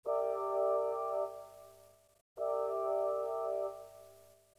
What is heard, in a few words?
trist chord pure